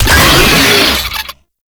Proto Infested Brachiolyst Player Spotted, Vocals, Enemies; Warframe